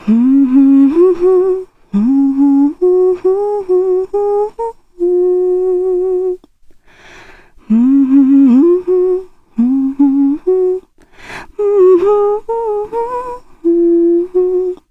Pop Vocal; Phantasy Star Online 2; PS Vita

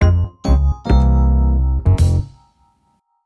Defeat; Tiana

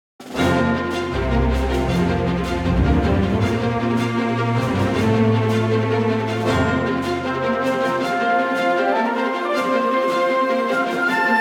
Main Theme Suite 157BPM; ps4; xbox series xs

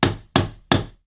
Hammer

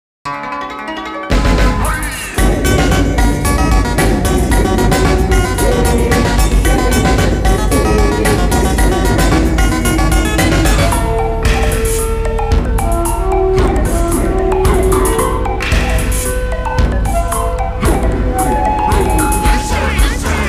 Openning BGM; gamerip; taiko no tatsujin 10; ps2

